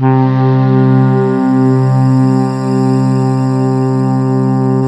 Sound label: SAXY C2